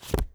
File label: book close; UI; ProjectEleri